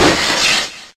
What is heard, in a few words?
Mirror Shatter